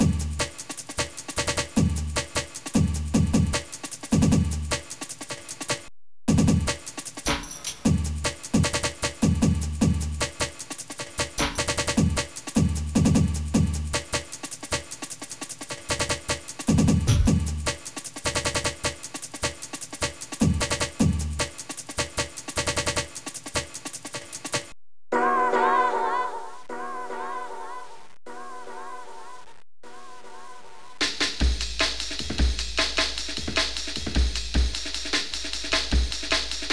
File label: drumz